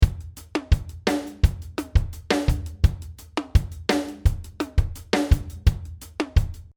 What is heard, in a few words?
Dancehall 85